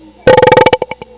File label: BERRP